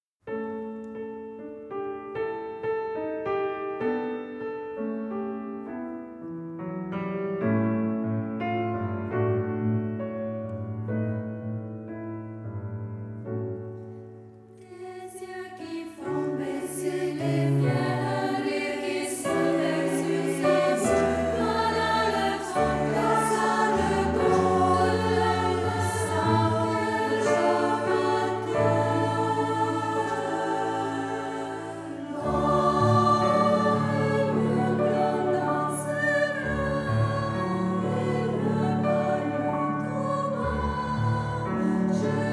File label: female Choir